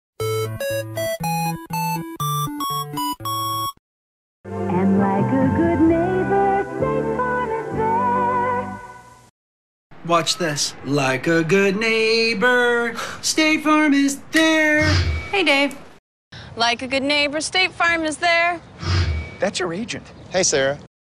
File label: JINGLE; State Farm; Speaking Human podcast; brand jingles